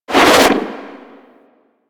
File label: Rifle Retract; DE; Warframe; Weapons; Sentient; Sent Rifle New War